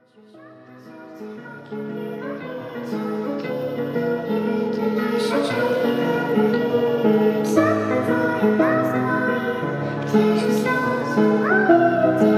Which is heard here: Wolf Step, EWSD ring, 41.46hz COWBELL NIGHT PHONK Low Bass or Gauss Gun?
41.46hz COWBELL NIGHT PHONK Low Bass